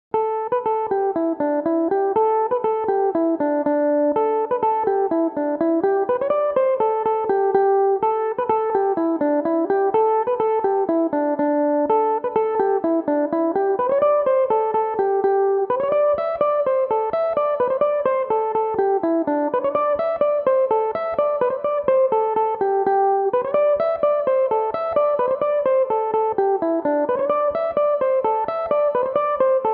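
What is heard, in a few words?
A minor, belmuse; Lower Drum Roll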